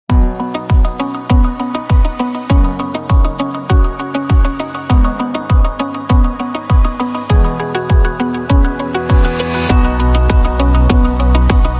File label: Educational Background; corporate intro